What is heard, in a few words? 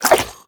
breath out, headcrab black; creatures